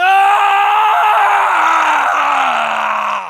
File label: fulton scream; mgsv